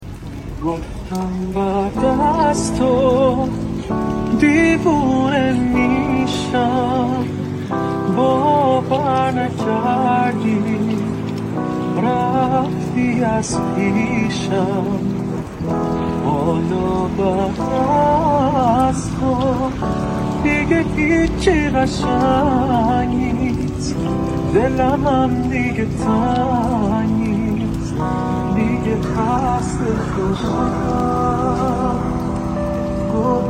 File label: Piano; ورژن پیانو